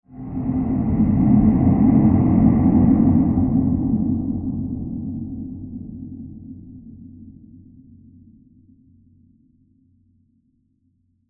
Diffuse Creepy Horror Transition; Scary Soundscapes; Crafted Signals

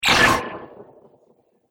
Laser Future Droid Repair

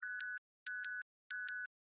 Science Fiction Computer Readout High Pitch Beeps; Computers

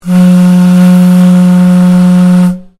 Ships Horn; Boat; Sounds onboard HMAS Castlemaine; Vehicles

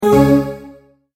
multimedia tone end

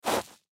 Footsteps Tennis Shoes Wood Chips Slide; Human; Foley; Blastwave

